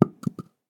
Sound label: Shell 12ga carpet; STALKER; IWP